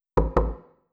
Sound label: pull trigger knock knock; hlvr; ui; Half Life Alyx